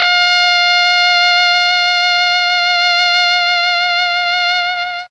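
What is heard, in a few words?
F4 trombone trumpet, mellotron; TROMB+TRMPT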